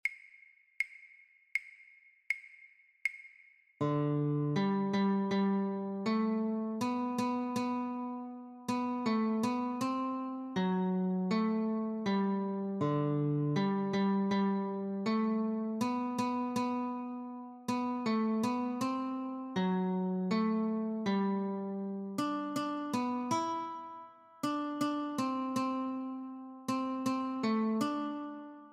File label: Notes, guitar